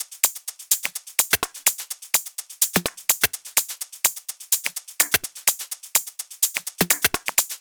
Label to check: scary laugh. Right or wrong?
Wrong